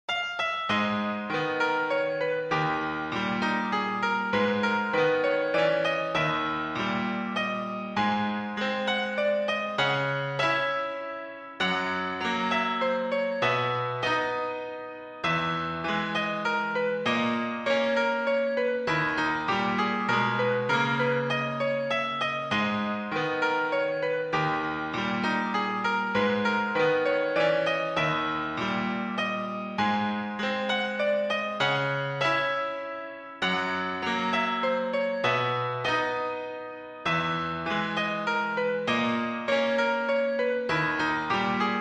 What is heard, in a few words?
Sad Song; Super Mario Galaxy 3; fan OST